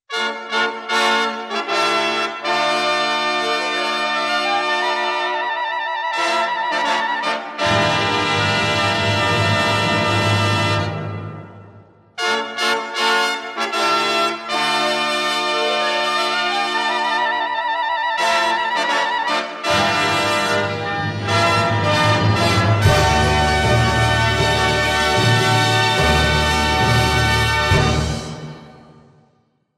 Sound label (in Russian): Fanfary; Pobeda